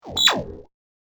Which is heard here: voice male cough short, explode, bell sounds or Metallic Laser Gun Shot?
Metallic Laser Gun Shot